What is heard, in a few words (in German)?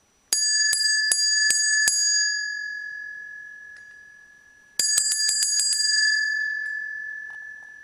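Weihnachtsglöckchen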